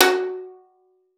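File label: violin pizzicato F#5; Xsample VOL-5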